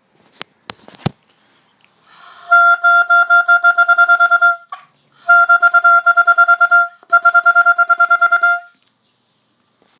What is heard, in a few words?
Legere Articulate